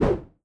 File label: swish